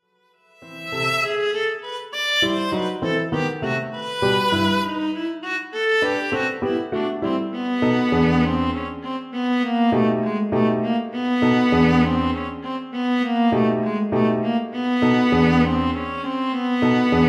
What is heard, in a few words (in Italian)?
scherzo; kabalewski